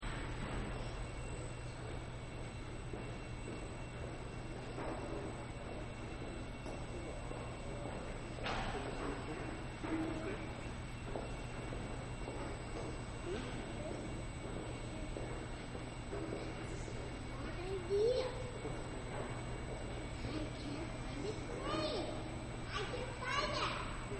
Subway Station Amb, Quiet Subway Station Ambience Loop-Hum Of Electricity And People Moving; Quiet Subway Station Ambience Loop-Hum Of Electricity And People Moving About And Talking; Subway Sound Effects